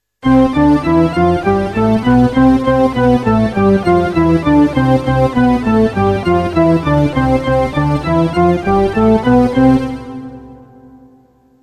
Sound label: Shep Scale; such a way that after completing an octave the initial composite tone is met again